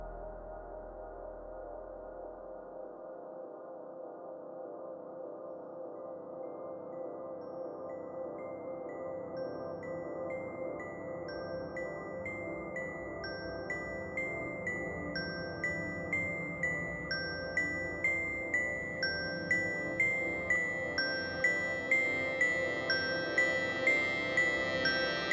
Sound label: Soundscape I Temple